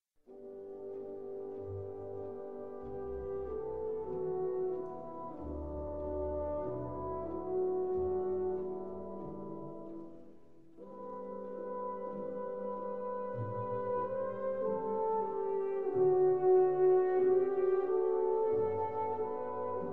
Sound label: Pavane for a Dead Princess, orchestral version